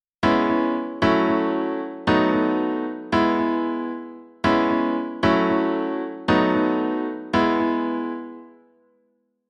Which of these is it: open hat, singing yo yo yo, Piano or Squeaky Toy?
Piano